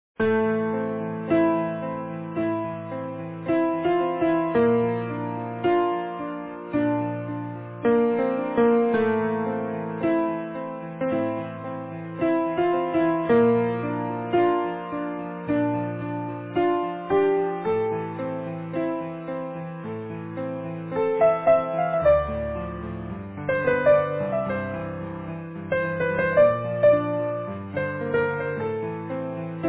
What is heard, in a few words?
easy piano